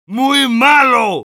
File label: negative vocalization, es; Heavy